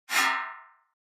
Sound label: Pop Up HF Elect Whip Re, pop up element